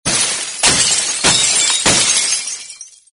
falls hits glass hits

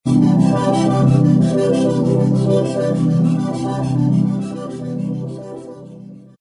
wavering pulse

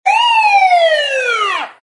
alarms siren short police

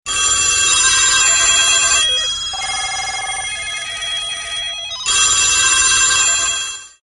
All The Bells Wake Up Alarm

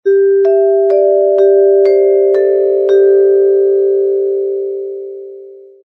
vibes high doorbell tune

